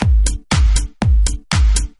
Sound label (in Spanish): Música Juego